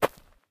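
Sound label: gravel, steps; Terrarum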